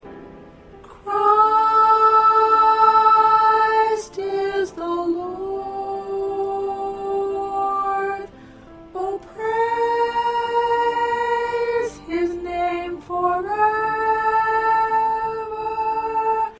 Soprano, choir parts

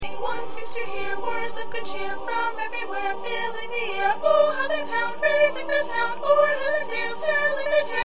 Soprano

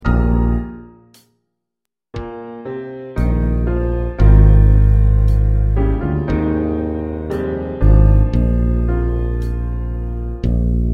Accompaniment

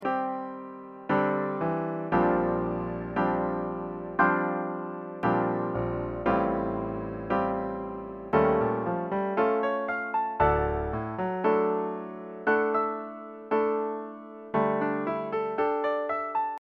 Piano

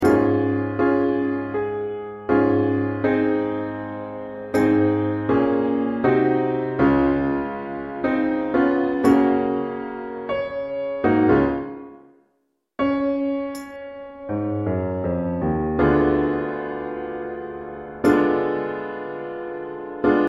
Accompaniment

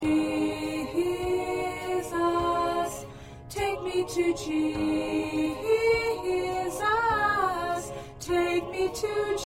High Voice

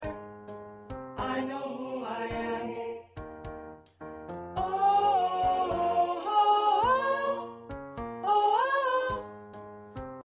Soprano, choir parts

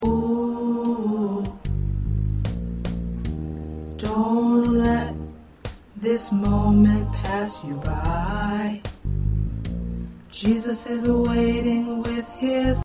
Tenor

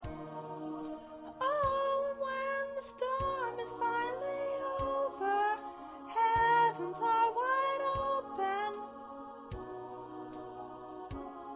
Lead

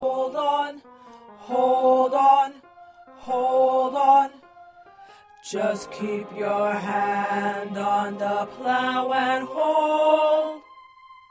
2nd Tenor

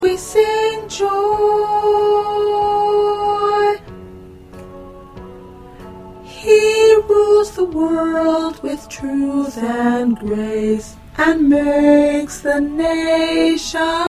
Alto 2

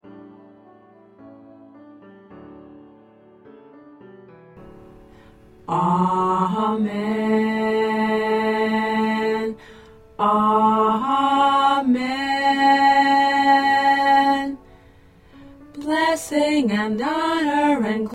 Tenor

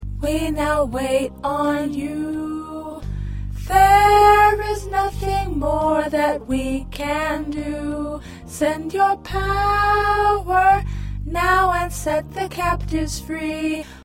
Alto, choir parts

